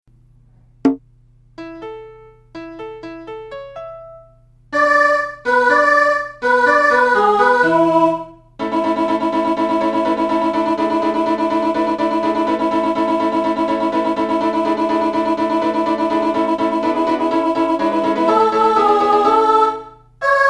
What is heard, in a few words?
mezzo; W.-A. Mozart; La petite musique de nuit